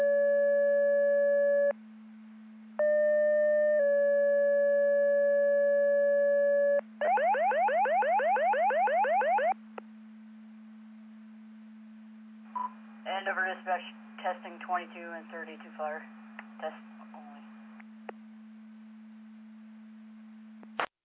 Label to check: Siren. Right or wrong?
Right